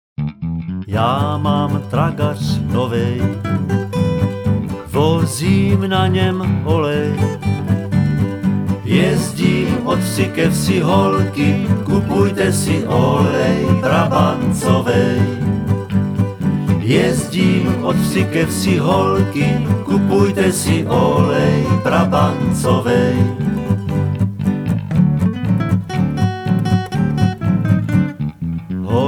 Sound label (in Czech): hymna